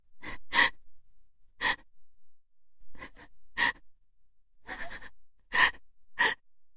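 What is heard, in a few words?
woman crying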